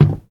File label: Wood soft